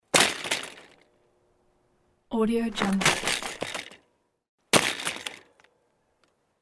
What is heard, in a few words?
falling bicycle